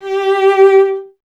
STR VC G 0, Cello; Miroslav Vitous; Solo Instruments VOL-1

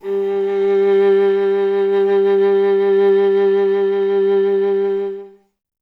viola G; Miroslav Vitous; Solo Instruments VOL-1

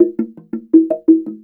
SLIT DR PH, SLIT DRUM

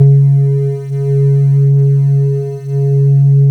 C#, Synclav FM, WIND; Big Fish Audio

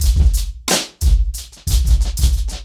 90BPM, acoustic drums; The Roots Drumloops

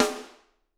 SD, Drums; Bob Clearmountain Drums I

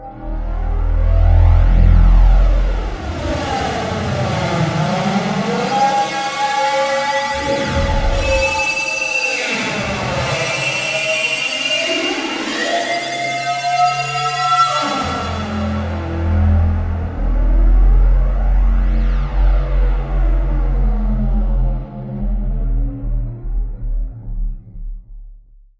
FX SWEEP

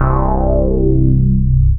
BAS, Eurotech; Sounds Good

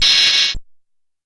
Cymbal Lo, Stylophone Beatbox

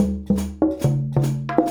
Mallet Drum and Hi Hat

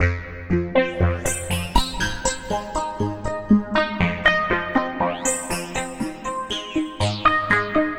Swirl Synth, Synth Textures; Sonic Foundry (Sony Creative Software); The Electronic Music Manuscript Collection

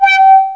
WAH; East West; Global Sounds

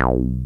303 RESO; Pro-Rec - Synthsonic

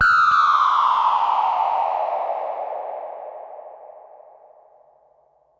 Classic FX; radiosonics; Classic Simple OSC FX

